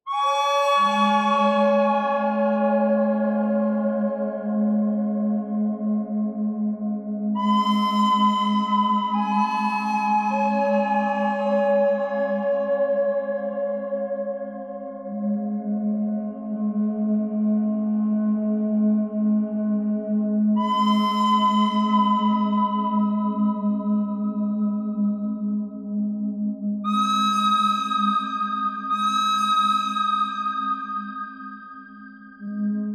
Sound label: bird thread pentatonic